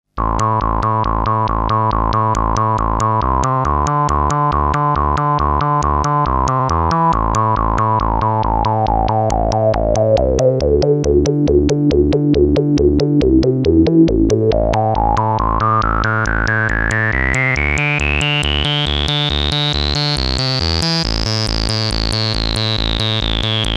PAiA 9308 Fatman, VCF AR

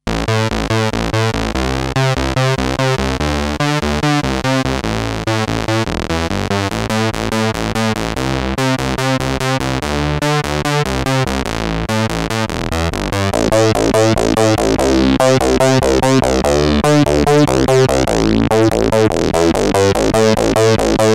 700S Switches, Korg 700S Synths

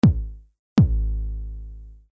TR-909 BD Mod Decay, Roland TR-909; Synths

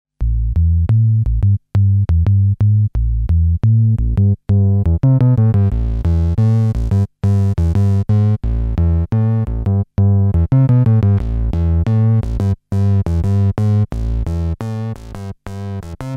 Korg 700S Various

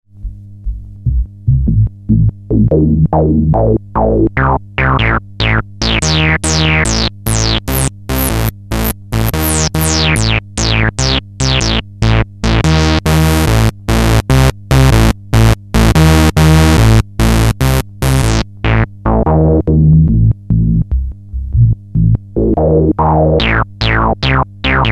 Moog Realistic MG-1 Filter

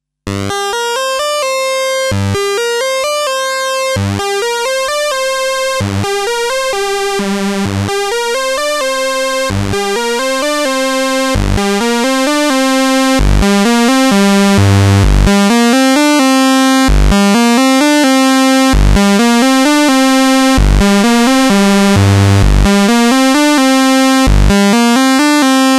Jen PWM, Jen SX1000 Synths

